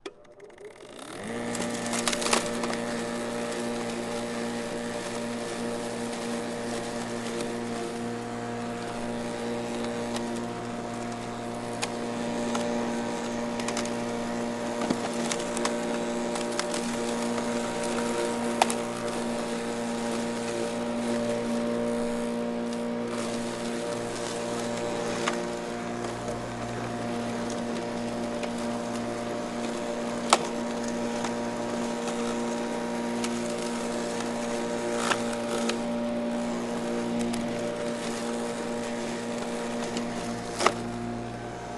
electric mower engine turn on turn off start stop mowing grass cutting branches trimming; sonniss gdc